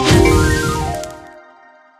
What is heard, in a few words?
prize hit; games; Fenix Play 27 Deluxe; Wazdan